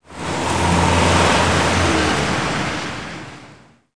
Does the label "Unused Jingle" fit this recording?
No